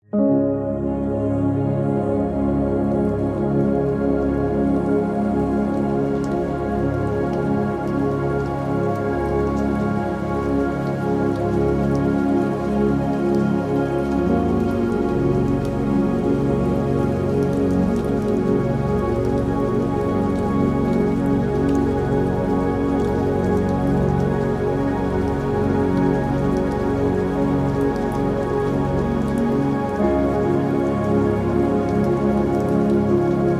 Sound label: Transient Rain